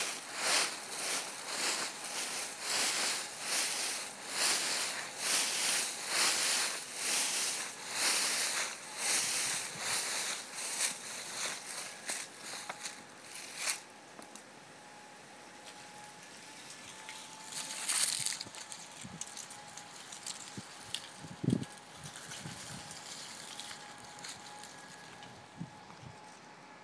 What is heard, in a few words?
the sound of autumn leaves